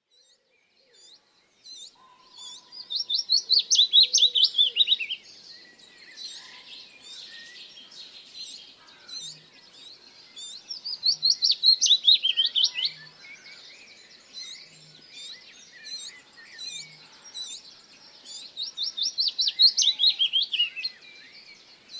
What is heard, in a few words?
willow warbler at dawn